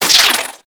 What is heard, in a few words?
Reverse Start; Weapons; Warframe; Tenno; Ink Rifle